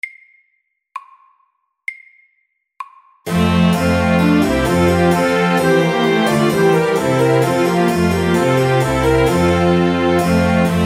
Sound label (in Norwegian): Trumpet tune MIDDELS